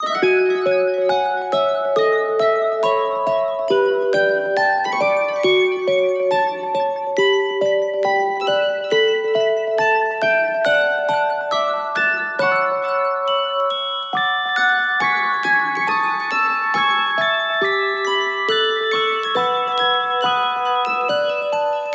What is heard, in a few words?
MYSTIC